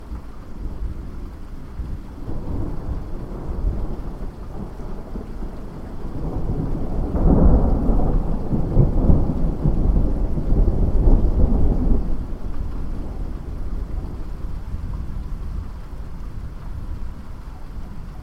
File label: lightning